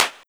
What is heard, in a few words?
Clap Dry Snap